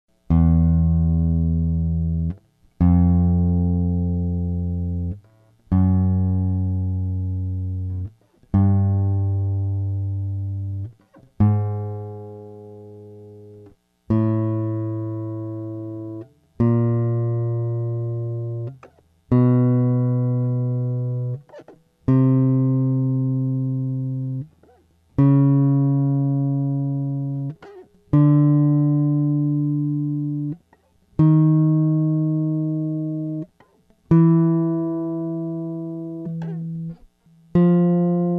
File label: yamaha fishman scale, guitar